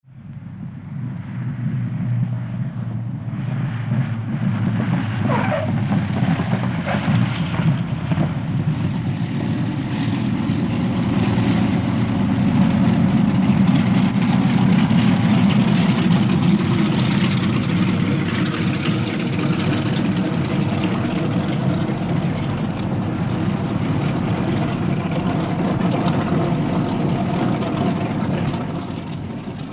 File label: AIRCRAFT PROP ANTIQUE TWIN ENGINE: EXT: Landing, wheel chirps, idle.; ww2 fighters; Older receding.